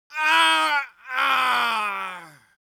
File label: human vocal male death